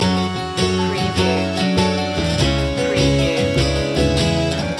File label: circus loop